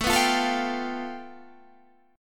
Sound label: A 7th Suspended 2nd Sharp 5th